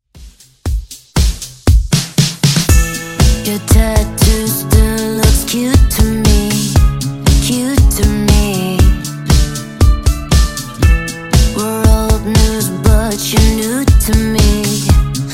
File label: Funk Intro Outro 118 Bpm